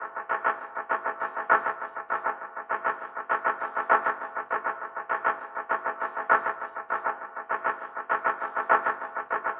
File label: giddiyup subtle, drum loops and breaks, 100 bpm; 091 to 100 bpm